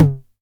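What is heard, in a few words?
909 high tom tape; Toms; Goldbaby Tape808 & Tape909; The Tape 909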